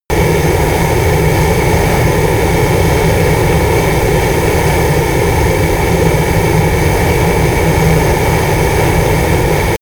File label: plane cessna private turbine full power close; flugzeuge verkehrsflugzeuge; verkehr transport